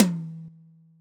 Perc Kong; SSO Percs

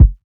Kick; SSO Kicks

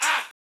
Chant; SSO Chants

